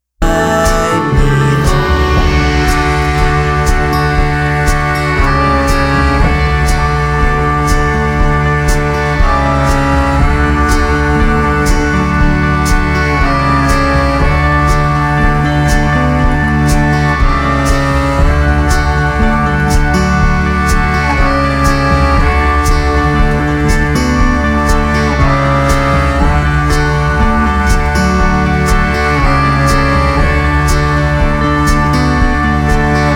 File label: String